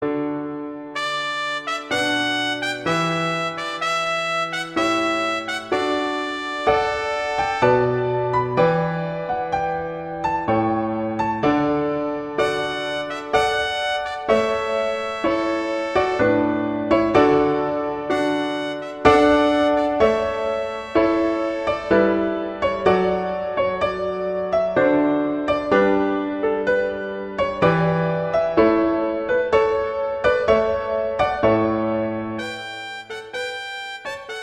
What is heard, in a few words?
Largo; Fasch; Concerto